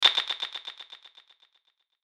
Hit High Filter, Hit High Filter Delay; Blastwave

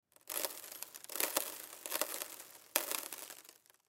Windshield Broken Crackle; Crackling; Foley

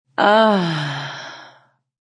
Female Moan Ahh; Female sighing; Groaning; Sound Effects:Voice

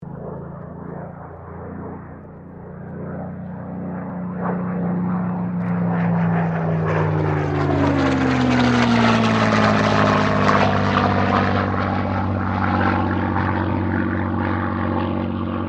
AIRCRAFT SUPERMARINE SPITFIRE MK-XVI PASS

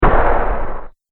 Dinosaur Mighty Footstep

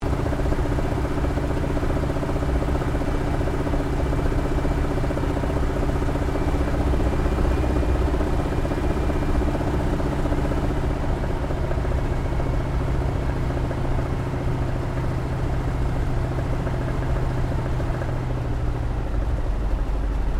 AIRCRAFT CAC WIRRAWAY TOUCH N GO INTERIOR; CA-16 Wirraway; Airplanes (Sound Effects:Vehicles)

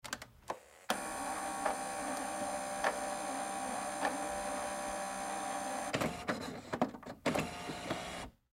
Printer Off; Inkjet; Office; Printer Turn On Warm Up; Printers; Electronics; Blastwave

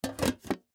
Tin Box Close Lid; Tin Box Empty Close Lid; Lid Sound Effects; Objects; Foley

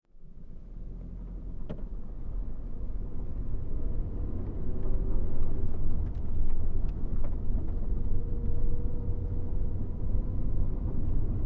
Car, Hatchback, Int Ride, Bumpy Dirt Road, Slow; Cars

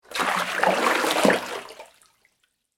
Water Movement Tub, Water, Movement, Large, Tub; Nature; BlastWave

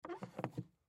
Case Pick Up Squeak; Case Pick Up Squeak Luggage; Foley; Sound Effects:Objects; SoundEffects-Blastwave

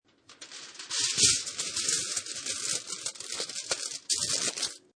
Metal Foil Aluminium Foil Crumble; Crunches

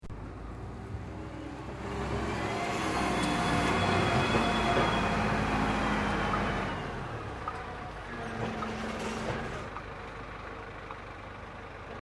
Tractor Earthmover Approaches Turns Idles; Tractors; Vehicles

